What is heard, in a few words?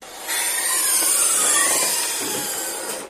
Hatchback Door Close; trunks & hoods